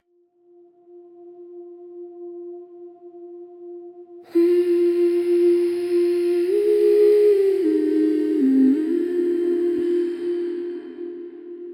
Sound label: Healing Waves